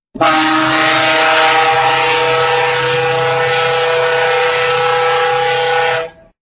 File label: Foghorn